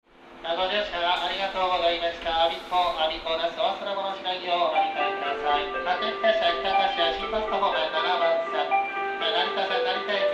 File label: melody, station